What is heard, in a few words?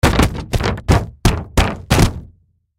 Body Fall Roll, Body Fall Roll Down Stairs Wood Surface Impact Human; Wrestling And Struggling; Blastwave; Horror